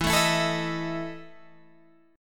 E Major 7th Suspended 4th, Guitar, Open G

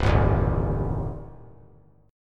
C Minor 6th, chord; Cm6 strummed; JGuitar

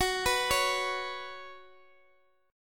Gb Suspended 4th Gb, down

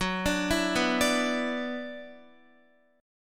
F sharp 7th Suspended 4th Sharp 5th, Guitar

